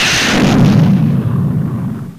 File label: explode